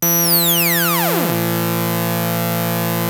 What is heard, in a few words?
ARP 2600 F3, synthesizer; Hollywood Edge - Giorgio Moroder Rare Synthesizer Collection